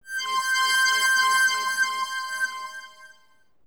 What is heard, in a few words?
ENTRY READ; maimai